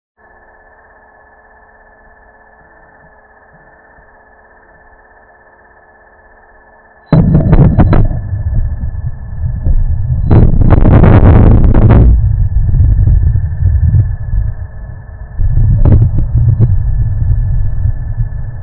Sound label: nw rota eruption sound